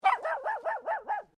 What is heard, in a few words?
Animal Dog Poodle Miniature Bark